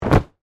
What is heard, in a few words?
Body Fall Mat, Gymnastics, Hard; Sports; BlastWave